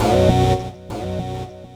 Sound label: DANCE STAB; E-MU Formula 4000 Series Vol. 2